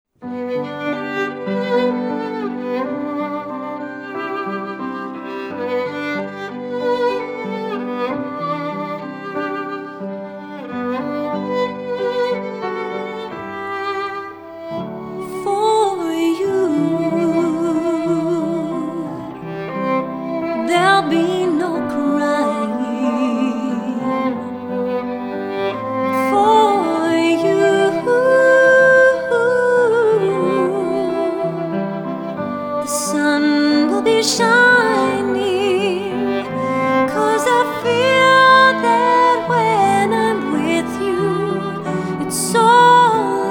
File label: with Piano Violin